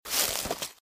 Footsteps - Bush walk - Right; Eden; RadwinIsland

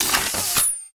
Gun Reload Scifi, Weapons Foley; SFX Kit

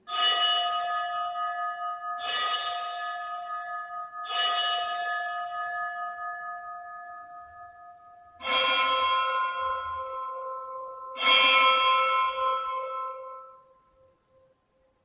Old clock; Inquisitor 3

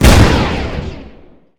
Cannon Turret Shot, Weapons

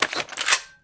Gun Draw Select Weapon, Weapons Foley; SFX Kit

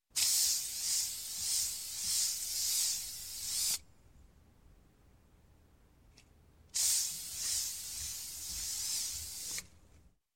Spray Can Aerosol Can; Sound Ideas 2000 Series

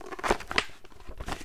Book Open; SFX Kit; Foley - Misc

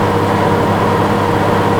Truck Semi Loop

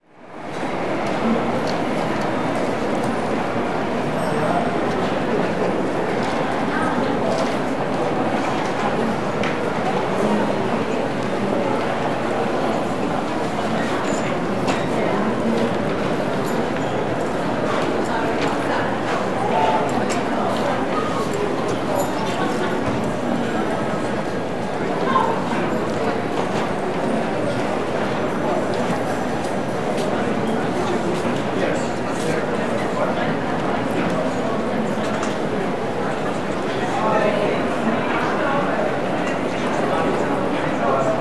Lobby Large Office Light Crowd; crowd walla; Sound Ideas; Walla Series

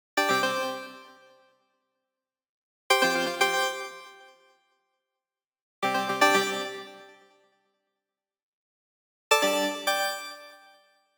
Arcade Jingle Neutral

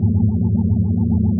Scifi Hover Sentry Loop; SFX Kit; Sci-Fi Loops

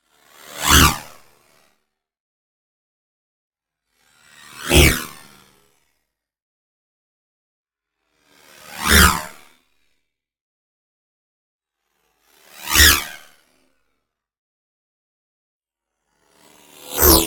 NEON WHOOSH SMALL FAST Discharge; boom library

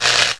electric shock; 1001 Sound Effects

